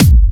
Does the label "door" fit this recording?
No